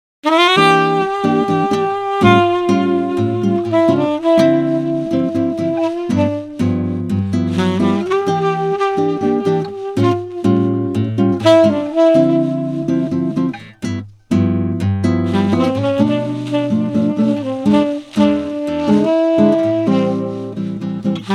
Bossax

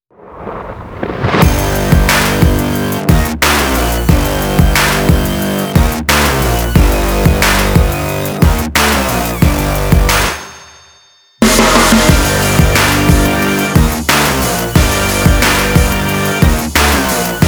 Heavy Electro Claps

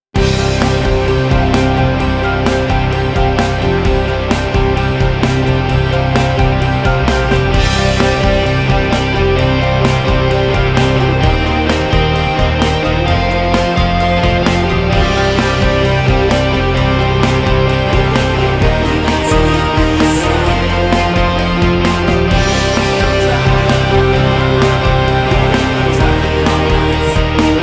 INST On the Road

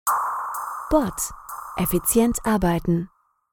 Sound Logo; LOFT VOICES

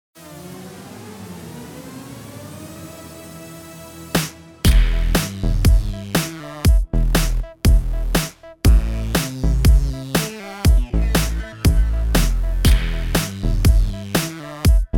groove pattern

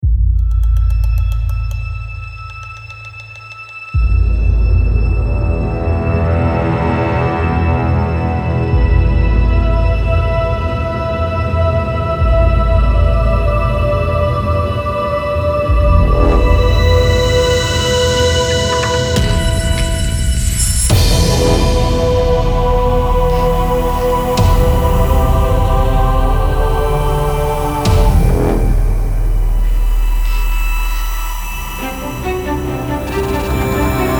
The Orch

